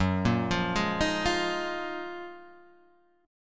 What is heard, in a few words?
F sharp Major 13th Guitar Half Step Down